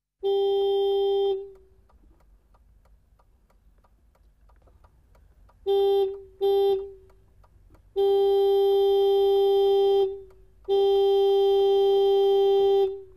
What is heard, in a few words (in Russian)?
gudok traktora iznutri